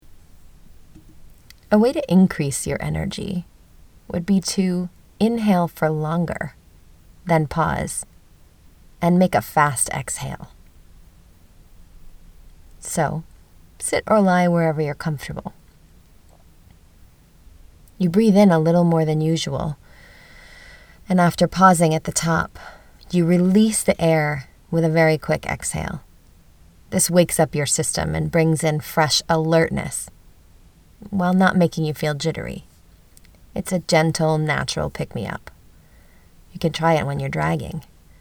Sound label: long exhale, fast exhale